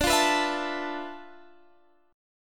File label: D-Minor 6th Add 9th, D, Guitar, Standard; 22,20,21,21,22,x; Dm6add9 strummed